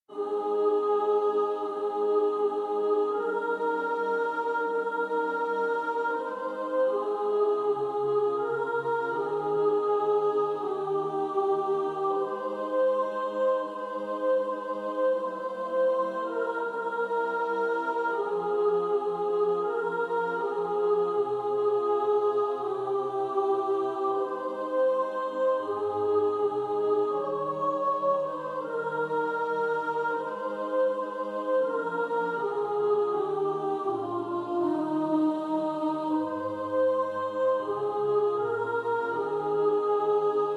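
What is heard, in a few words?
soprano, practice music; Praise ye the triune God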